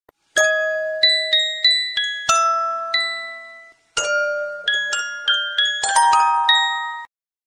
Scary And Strange Ringtone

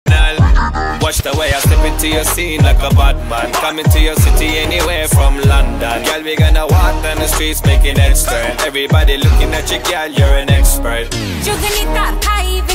Punjabi Ringtone

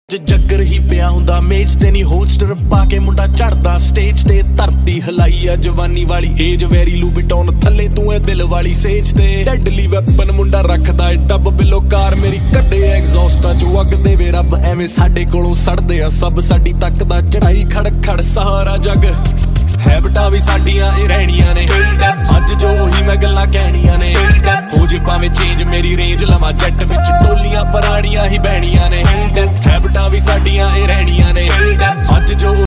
Dance Punjabi Ringtone

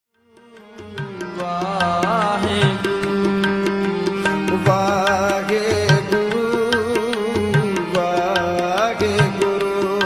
Waheguru Waheguru God Ringtone

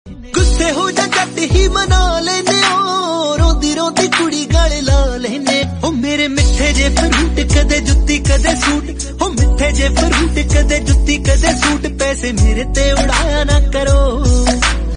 Ringtone

